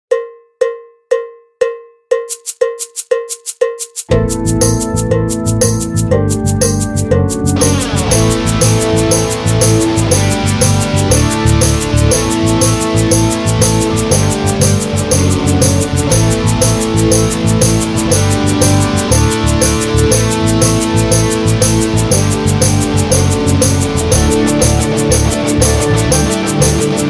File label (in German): Loop Ternaer 120